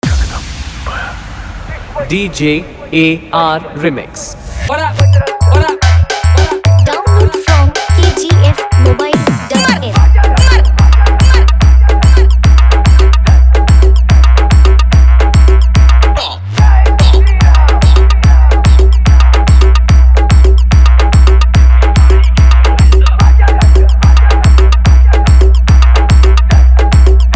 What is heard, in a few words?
Ram Puja Special Bhakti Humming Dancing Road Remix; Bajrangdal